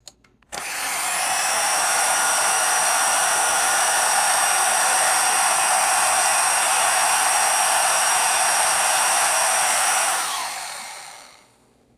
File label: hair dryer starting running and stopping